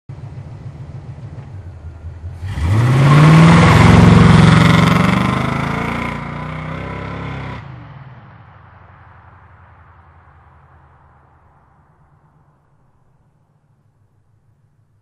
brake; WMBonusXP

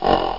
deer; amigamud

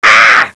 aahhhh

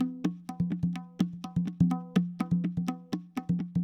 PERC LOOP ETHNO 125; eJay

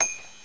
Ding; Ocen Explorers

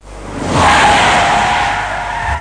tyres; BlueGame

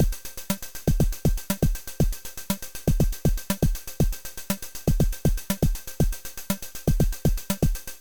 DRUMBEAT

